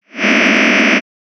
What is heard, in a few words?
cluster closed quarter